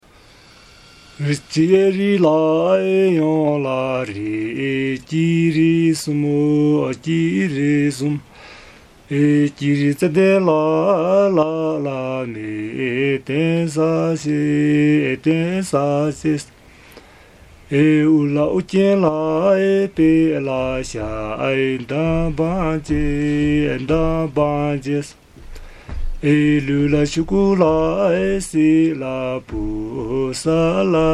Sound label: Tha Rgyas Folk Song